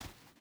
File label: footstep boots sand walk; Rust